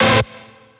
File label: Techno Synth Shot C; Synth One-Shots; Ghosthack; 45 Free Techno Synth Shots 2025